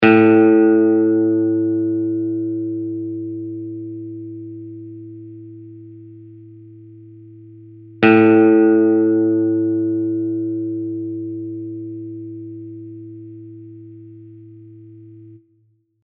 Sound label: tune 5A